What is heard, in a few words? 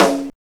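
snare drum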